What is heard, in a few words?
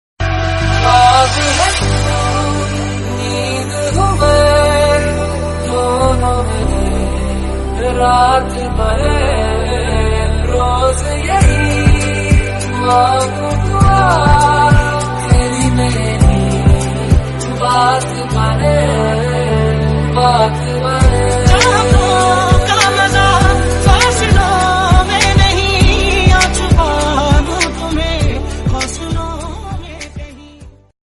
hindi love mashup